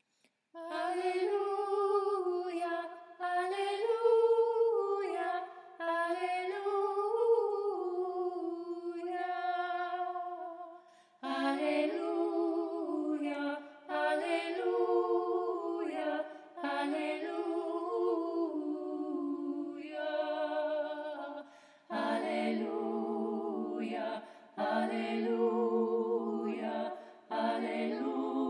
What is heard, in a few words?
Alleluia Russian Orthodox